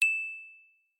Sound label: Ting, notifications; Lucid 4G VS840; LG